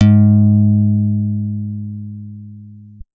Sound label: guitar acoustic, acoustic guitar nylon